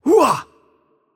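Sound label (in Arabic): Attack Heavy, Arabic; ar AE; VO; bloom minigame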